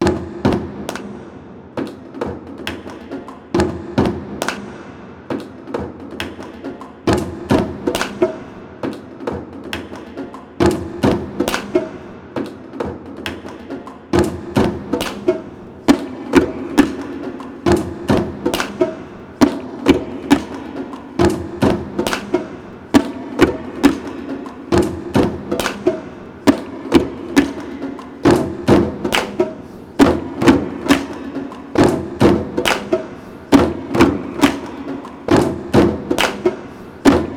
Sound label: ROCK YOU DRUMS mix